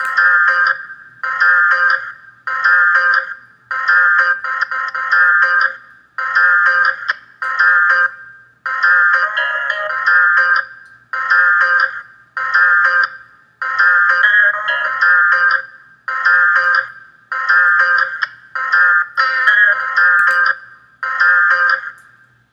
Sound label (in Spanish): melodia procesada